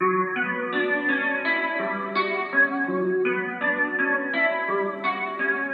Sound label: Dark Melody Loop - 167 BPM B Min Guitar; Melodies; Loop Stems; Cymatics; Oracle Sample Pack